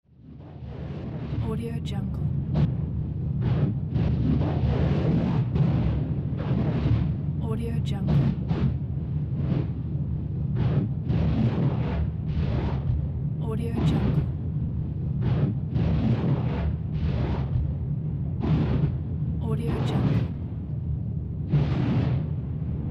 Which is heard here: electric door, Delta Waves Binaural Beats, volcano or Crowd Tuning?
volcano